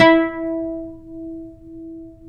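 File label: pluck OUD E; Roland - LA Composer vol. 3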